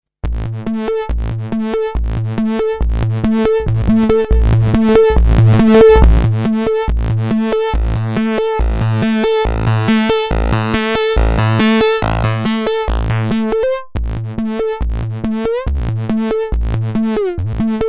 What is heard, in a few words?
sync sang